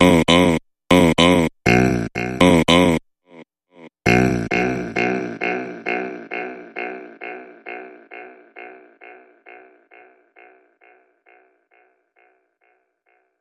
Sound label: Oh Vocal Variation